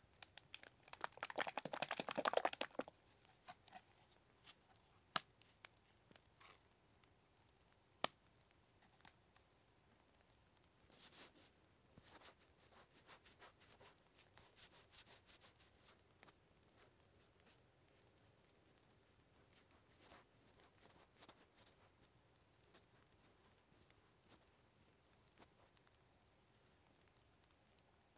シンプル耳かき六月七分雨音小

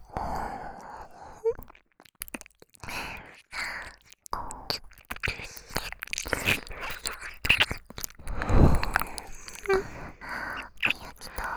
耳舐め

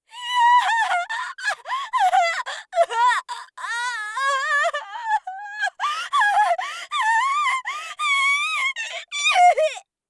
発狂; 効果音なし

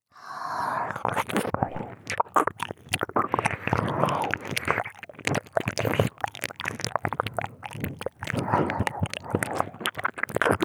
両耳舐め; お姉ちゃん

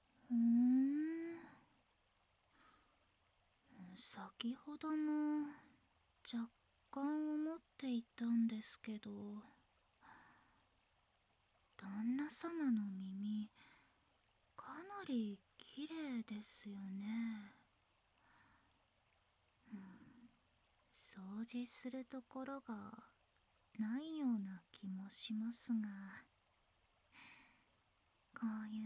左耳かき

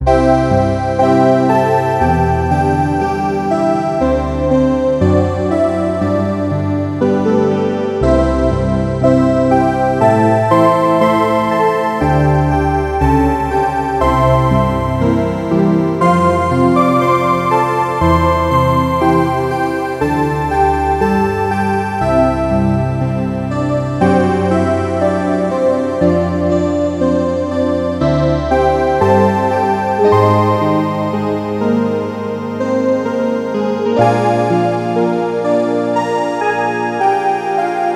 夕焼け小焼けチャイム; 劇中BGM

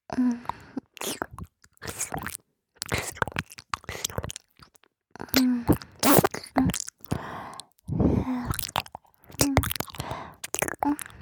ぺろっ…ぇろん…, 右耳

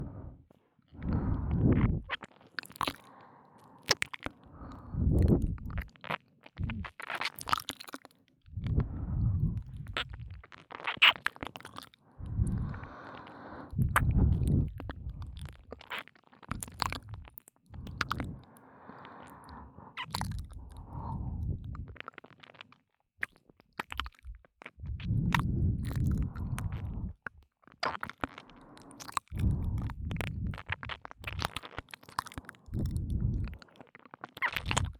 キス耳舐め両耳; 耳舐めコレクション

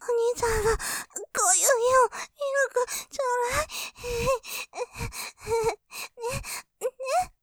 喘ぎ, ASMR; とろとろで精液おねだり; ループで楽しむ！シェフの気まぐれ抜き抜きコース; モノラル

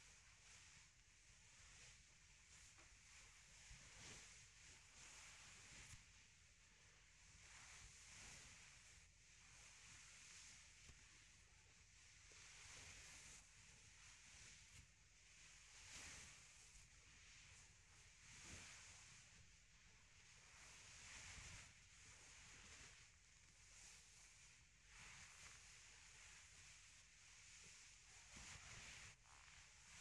耳奥舐め準備マッサージ, ASMR

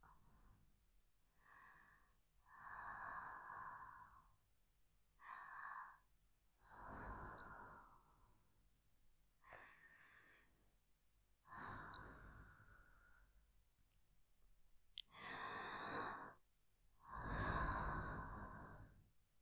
寝息 すーぅ、はぁー、すぅはぁ…。; ――そして、また朝が始まる！ループへGO！; ステレオ

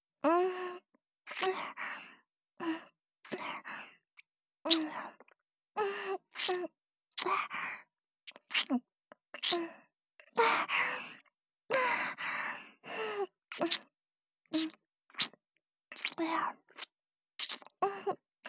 耳舐め【ループ】

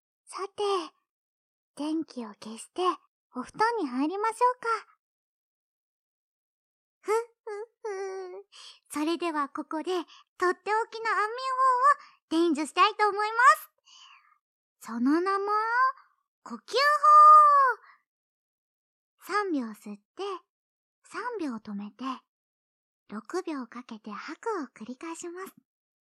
安眠呼吸法（効果音なし）; 効果音＆加工なし

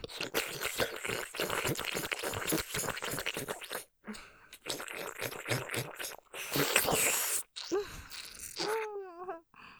舐め音, さらにテンポ早く, 音のみ, ASMR; ループで楽しむ！シェフの気まぐれ抜き抜きコース

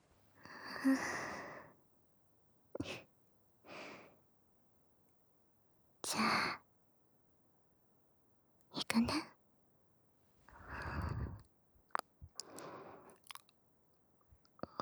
囁き 耳舐め 【右耳】

